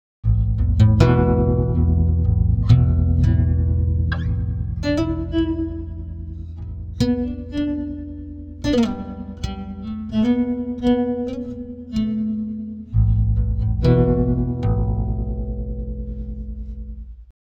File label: guitar, pitchshift; ikm sample tank ps ts